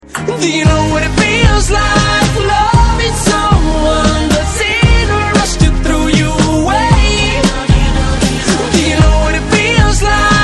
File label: ping pong song, ringtone